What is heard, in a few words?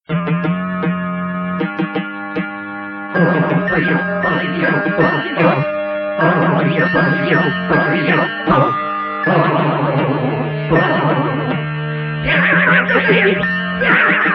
Jingle